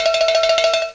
Rattle; Princess and the Pea; SoftKey

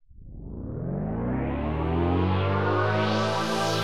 SYNTH PAD HOUSE 125 A; eJay; Sphere

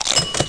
crunch; Coin Critters

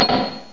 HAMMER; Club Amiga de Montreal - CAM; chatterbox v1.0

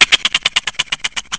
bug laugh; Severance

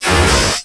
Shields Take Damage; Defiance

